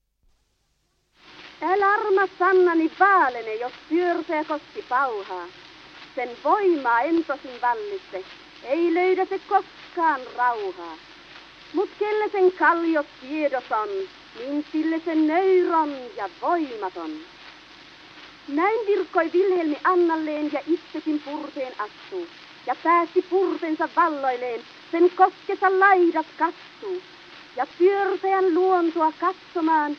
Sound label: Gramophone